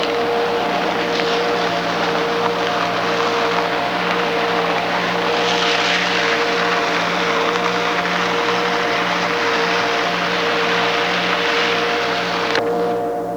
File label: car